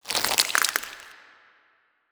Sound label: expand horizontal out shells; biogenesis